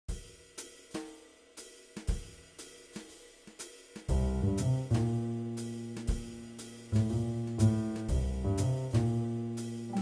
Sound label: Jazz; Pony Jazz